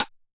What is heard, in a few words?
Rim